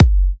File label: F# kicks; markus hakala kicks